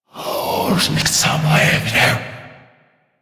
Possessed Red Veil B Vocal Enemy Spotted A, Enemies; Warframe; Priest Quest